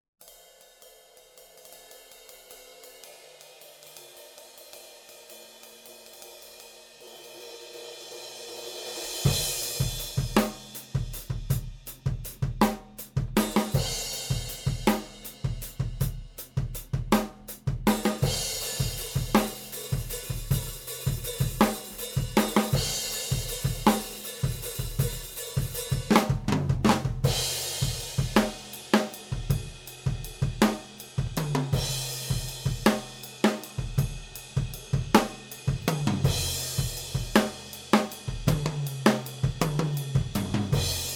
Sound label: Drum Overheads